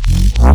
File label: Bass F Down